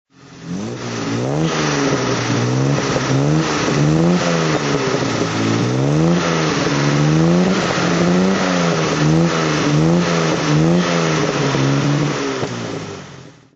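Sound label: jaguar xk150 3.4 throttle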